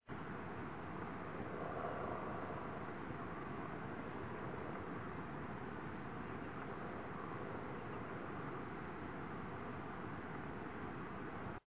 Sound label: wind blowing